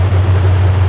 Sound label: propane, airgas